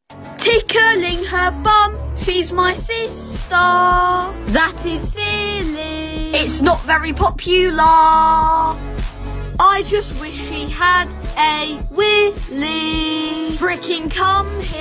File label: tickling